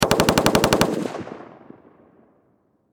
Machine Gun M16 Long Burst Distant Perspective Farther Away